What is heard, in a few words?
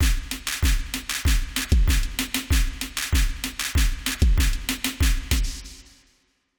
SD wet